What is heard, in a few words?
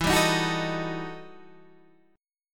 E Minor Major 11th E Guitar Standard; EmM11 strummed; 12,10,13,11,10,11